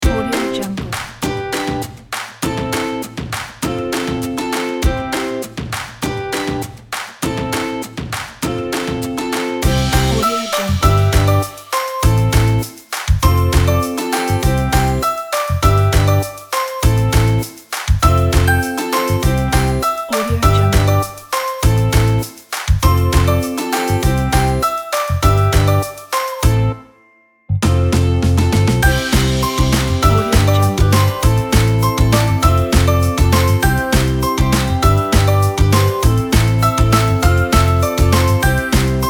happy

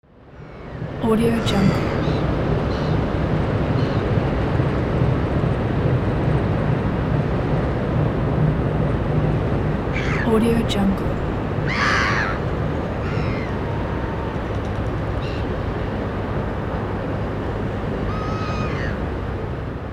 ship passing by and gulls

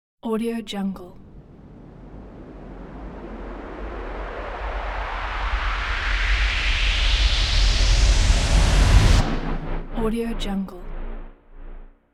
cinematic riser

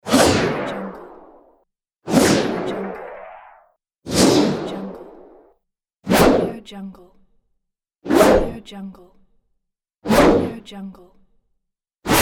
weapon spear pack

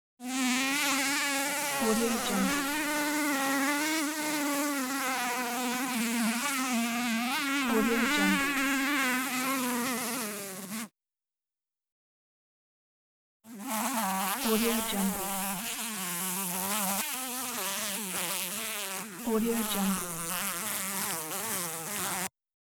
bee; sounddivision

